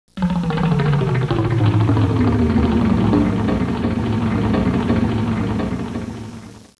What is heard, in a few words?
Drum Gliss